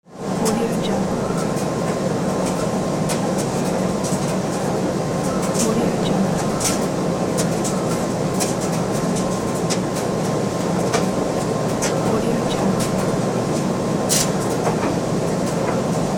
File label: laundromat